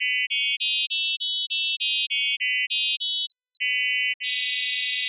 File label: missing fundamental; Pitch; Melodia